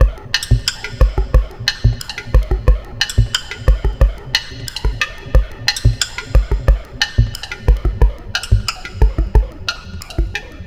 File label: Percussive